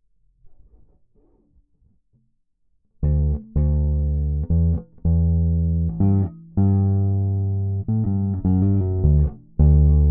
bass chorus

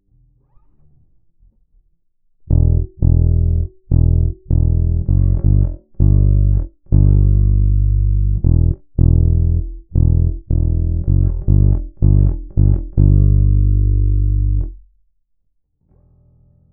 bass prechorus

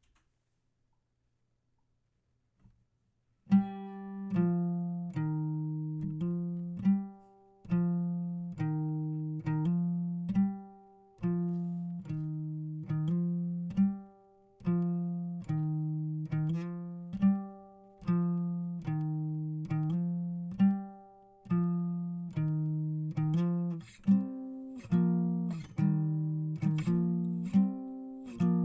guitar acoustic

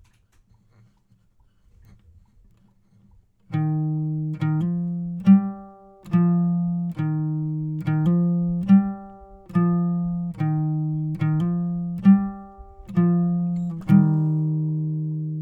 gtr outro

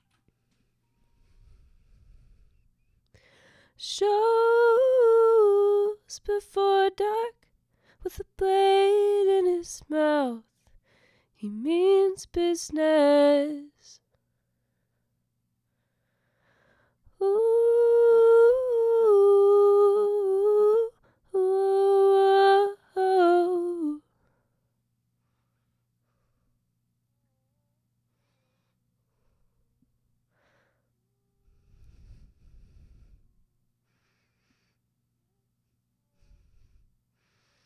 vox harmony

